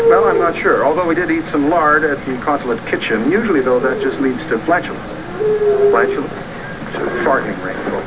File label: farting